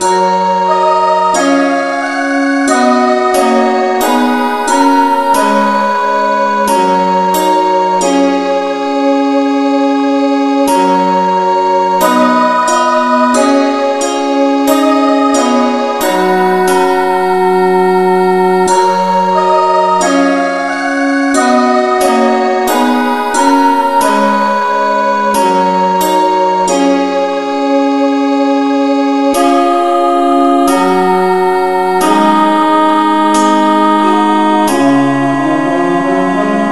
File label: no vocal key ges